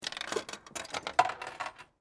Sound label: Pinball Machine, Technology, Pinball, Machine, Insert, Quarter, Into, Slot; Arcade; BlastWave